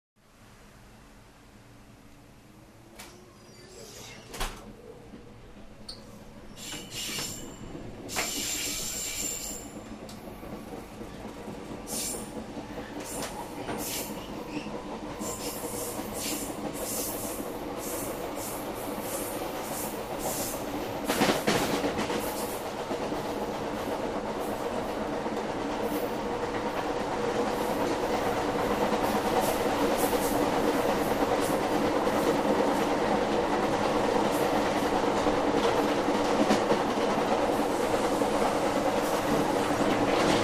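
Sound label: Train Interior Start Away Stop, Train Starts And Then Finally Stops.; Septa Train Starts And Then Finally Stops.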